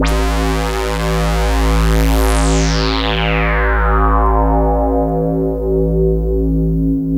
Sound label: LUCKY SWP, SYNTH; Keith Emerson Signature Series